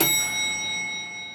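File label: elev bell ding, elevator bell ding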